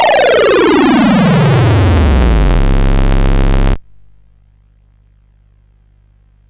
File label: Dragonworld sid CSG8580R5